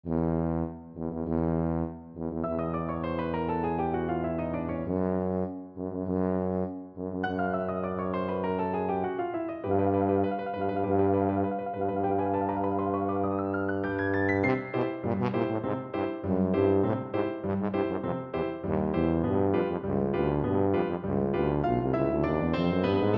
tuba

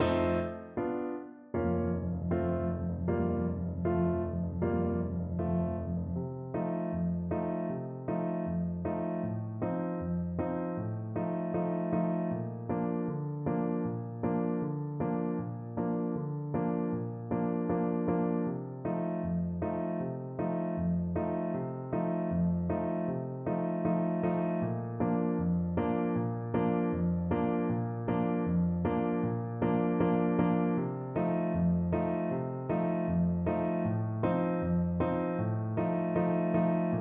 thunder Euphonium

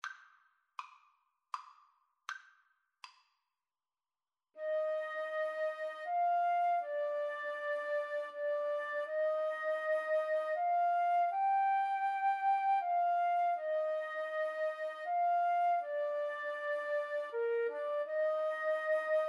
flute duet

